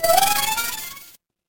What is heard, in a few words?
retro game power up